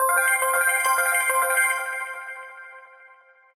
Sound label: brilliant tone samsung s7 ringtone